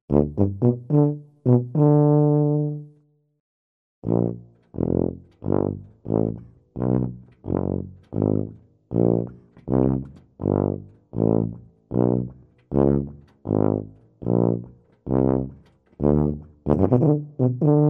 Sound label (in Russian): sportivnye vstavki vna tube komichno